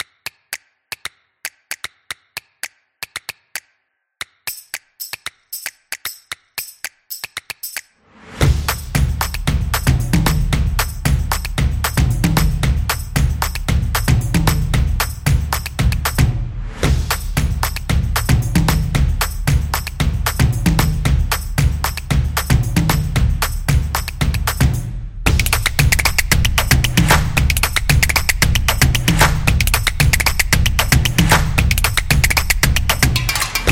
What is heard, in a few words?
The Drums Dynamic Opener; energetic drumming